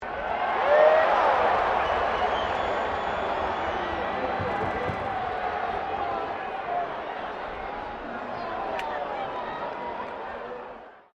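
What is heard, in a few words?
crowd 15K Rugby World Cup cheer medium, Rugby world cup game; Crowd Sound Effects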